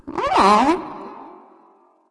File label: defeat; bastet